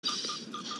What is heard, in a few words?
handlink button press